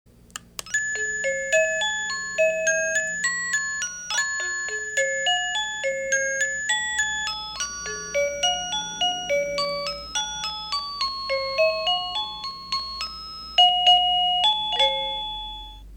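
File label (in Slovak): Bezdrôtový zvonček melódie ukážka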